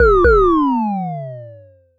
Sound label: Sci fi